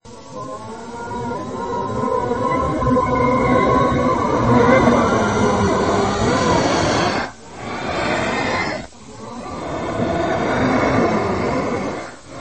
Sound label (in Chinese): 蜂群嗡嗡; 分类：动物